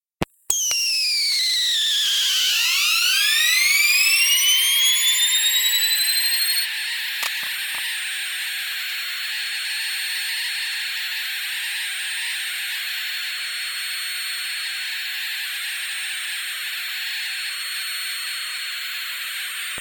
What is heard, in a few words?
Acer Altos G300 boot loop